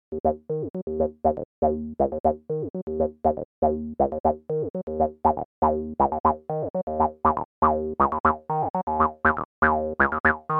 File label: SQR; ABL2 versus ABL3